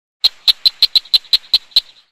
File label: Frog Cricket Chirps